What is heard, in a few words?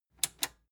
metal button tiny click disable slow